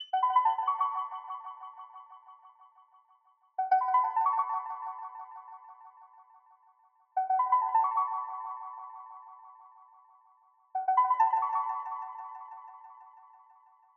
Mallet, 134 BPM F# Min; Cymatics - 2022 Melody USB Expansion; Infinite

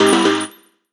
Collectibles Various FX; Cymatics; Video Game Sample Pack Vol 1

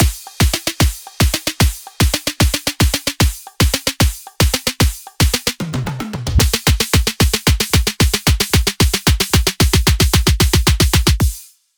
Dubstep Buildup Drums - 150 BPM; Loops; Cymatics; EDM Starter Pack

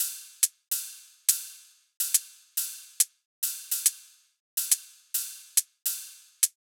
Riddim Drum Loop 140 Top; Ghosthack; Riddim Essentials Volume 2; Full Drum Loops

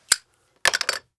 Zippo Lighter Flick Down; Fairlight Sound Lib

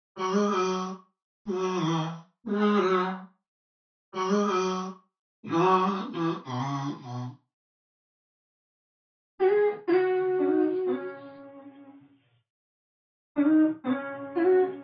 121 BPM C# Min Vocals; Loop Stems; Cymatics; Ashtray

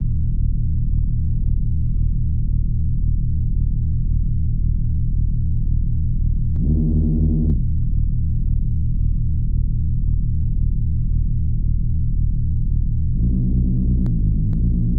128 BPM D# Min Reese; House; Loop Stems; Cymatics; Breakdown Loops

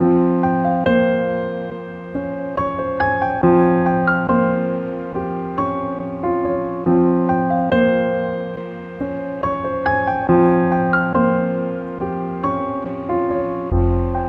140 BPM F Min, Melody, Trap; Cymatics; 2022 Melody Collection; Trap Pt 2

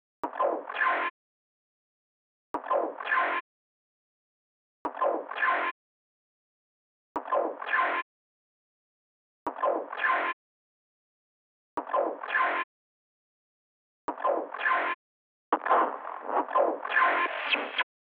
104 BPM F Min Glitch; Melody Loops; Edge Runner; Cymatics; ANALOG EVOLUTION Beta; Cyber Collection

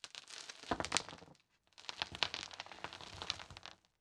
Pouring Dirt; Fairlight Sound Lib

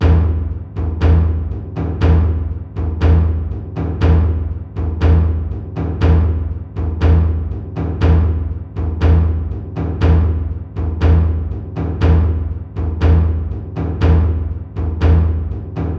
Frame Drums 120BPM; Percussion Kits; STEMS; Ghosthack; SkyRealm; Kit 11 - Natural Selection

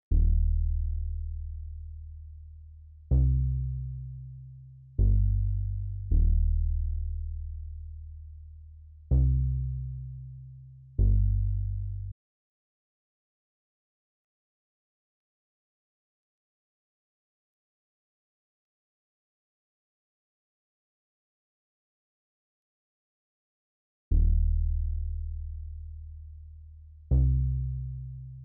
160 BPM G Min Bass; RnB; Loop Stems; Cymatics; Aroma; 2022 Melody Collection; RnB Pt 1

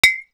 fx glass, Cartoon Film Effects

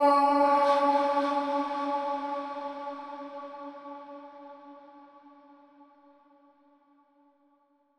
110bpm E, Vocalpack

